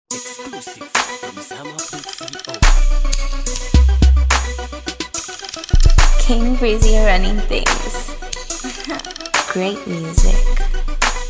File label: Jump Off Beat